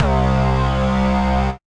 Bass, Synth Bass; Fetta Bass; Ableton; Beat Tools

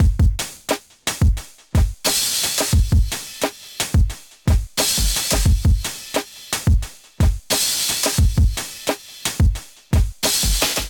Groove 88 BPM, Drums; MIDI Clips; Around the Corner; Ableton; Factory Packs; Chop and Swing

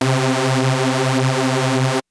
Saw; Ableton; Wicked Kits; MegaWave

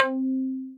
Stiffpluck, Plucked; Instruments; Ableton; Synth Essentials; Tension

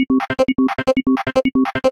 Bleeper; Ableton; Retro Synths; Vector; Vector Wave Sequences

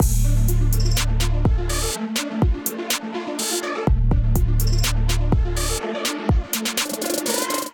Abyss Kit groove 59 bpm; MIDI Clips; Ableton; Factory Packs; Beat Tools

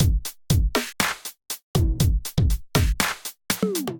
Kit, Drums, Hybrid; Hilda Kit; Ableton; Factory Packs; Drum Essentials

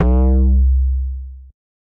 Particle Sub Bass; Ableton; Glitch and Wash

